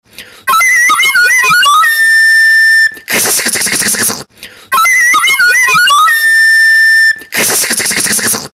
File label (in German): floetensolo